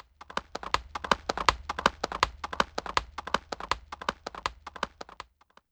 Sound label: Horse; YAMAHA RM1X